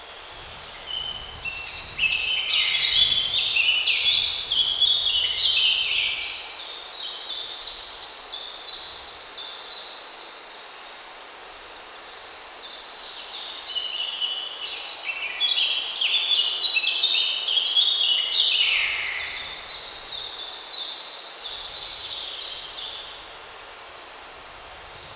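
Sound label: Ambience Golf Crowd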